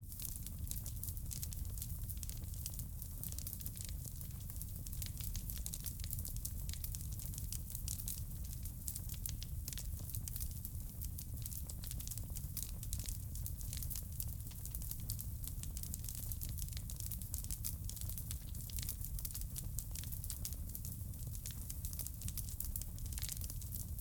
Fire burn Fireplace Artificial Crackling Roar Wooden Room